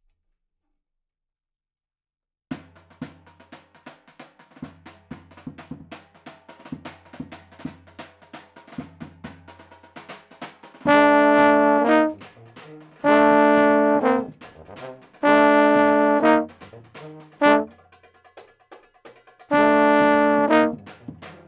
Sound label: euphoniums